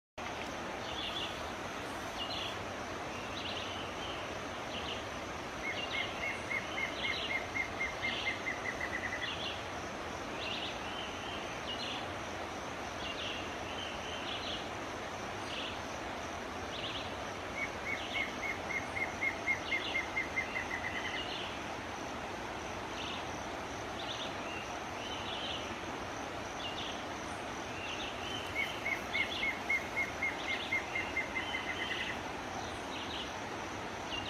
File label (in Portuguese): Canto do choquinha lisa